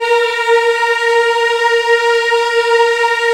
A#3 WOM EH, Choir; USB Soundscan vol.28; Acoustic & Synth; AKAI

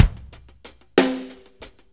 6-8 Loop, Full Kit Loops; Sonic Foundry; Loops & Samples Drum Tools

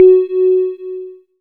SYN WET FEE, Microwave; Sound & Vision - Gigapack I CD 2

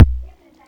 Roland f30 drums

